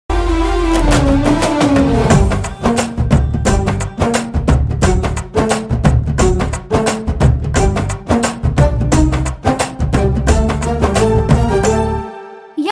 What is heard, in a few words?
bgm music dance